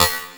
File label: SD, Drum & Bass, 160 BPM; Ueberschall; Drum & Bass Flash Trackz